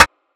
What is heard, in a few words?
Rim, Percs